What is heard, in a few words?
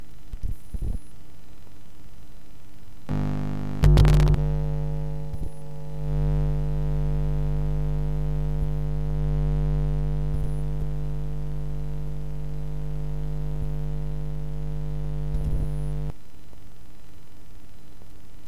light, telephone pickup